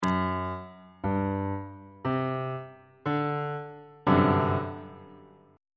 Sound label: g major 7th suspended 4th 3rd inversion on bass clef